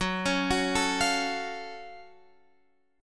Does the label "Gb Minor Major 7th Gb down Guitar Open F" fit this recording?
Yes